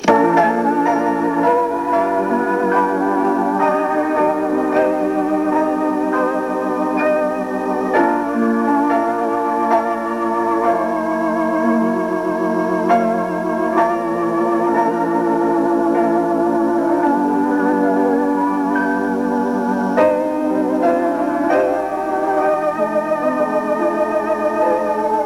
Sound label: piano and organ